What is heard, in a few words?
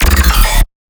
Bass Swivel 200 C#; Flaming